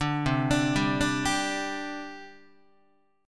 C Suspended 2nd, C, down, Guitar

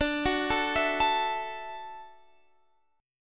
D-Major Add 9th, D, Guitar, Standard; arpeggiated

